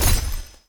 shoot flash; Epic Toon FX

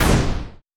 explosion fireball; Epic Toon FX

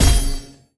explosion flash; Epic Toon FX

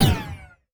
explosion magic; Epic Toon FX

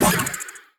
explosion soul; Epic Toon FX

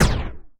explosion laser; Epic Toon FX

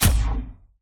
explosion magic; Epic Toon FX

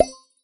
explosion mini magic; Epic Toon FX

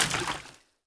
explosion liquid; Epic Toon FX

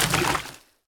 explosion liquid; Epic Toon FX

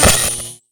shoot lightning; Epic Toon FX

